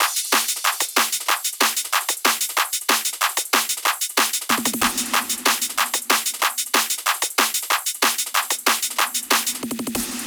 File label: Perc Loop Claps